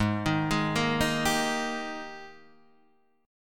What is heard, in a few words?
G sharp Major 7th Suspended 2nd, Guitar, Standard; 4,6,6,3,4,3; JGuitar